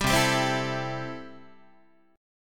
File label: C 6th C down Guitar; C6 strummed